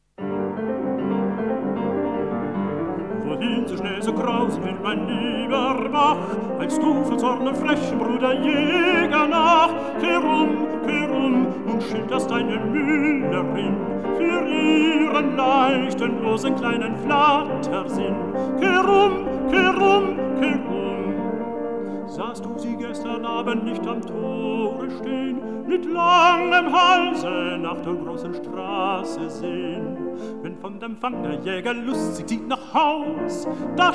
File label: piano; Franz Schubert: Die schöne Müllerin